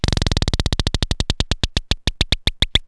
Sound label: bnce drip, cartoon; Wired for Sound Pro CD; Aristosoft, Inc.